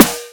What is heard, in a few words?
snr badness, snare